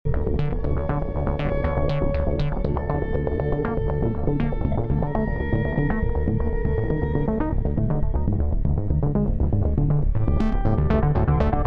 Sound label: ARP patch